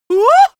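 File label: female scared comic, screams; schreie; menschen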